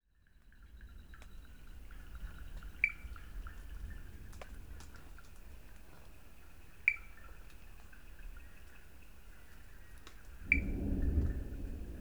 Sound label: Wood Frogs and thunder, Sri Lanka